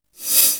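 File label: house hat, cymbal; East West; Global Sounds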